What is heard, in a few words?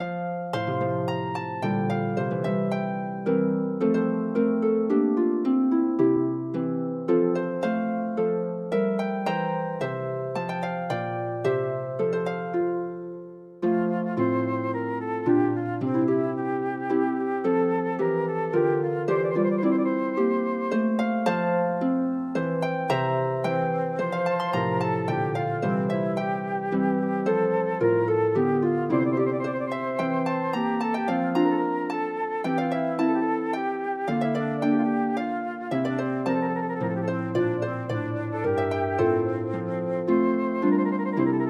for flute harp; The smiling hours; Hercules